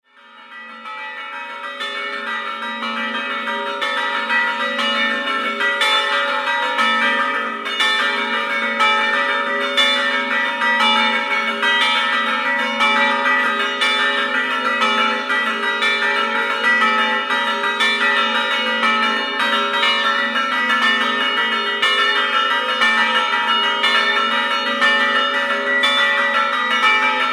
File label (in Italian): AVILLA, campane